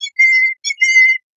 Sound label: happy; Open Duck Mini Runtime; mini bdx runtime